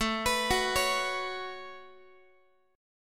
A 6th Add 9th, Guitar; A6add9 arpeggiated; x,17,14,16,17,x

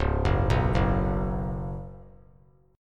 Db 7th Suspended 4th Db down; JGuitar

